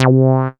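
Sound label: synth analog; Best Service Gigapack 1; SYN 02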